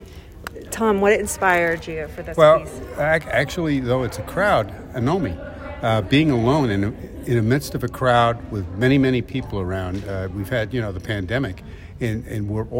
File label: Crowd; Personal Expressions in Watercolor